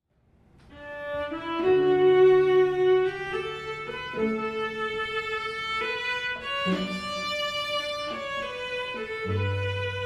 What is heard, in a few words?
Hymn, string quartet; little rock string quartet